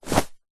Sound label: foley clothing shorts cotton thin drop carpet